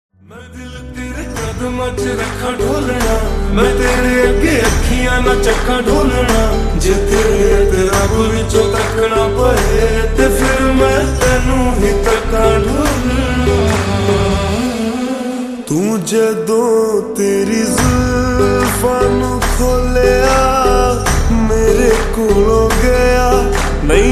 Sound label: Slowed Reverb Ringtone